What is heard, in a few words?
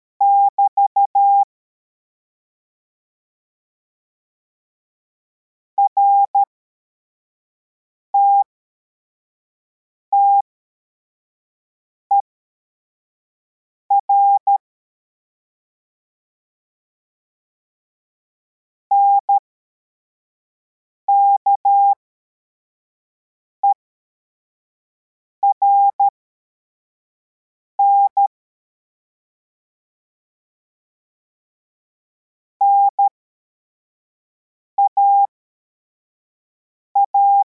cw, morse